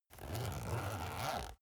zipper use

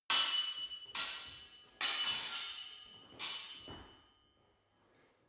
sword hit sword sequence short

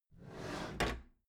packed drawer smooth clatter open regular halfway

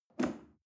chair wood take soft

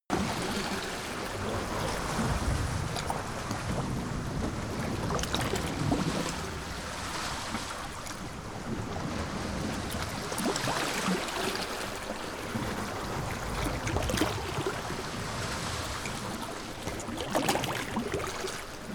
coast loop cliff soft

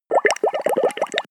bubble fast short

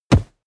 punch solid

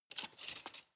cutlery large drawer grab soft

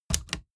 typewriter

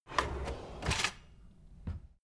drawer kitchen close loud

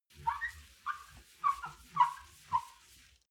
clean window paper towel close

